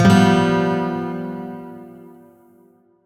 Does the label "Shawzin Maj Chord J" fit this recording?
Yes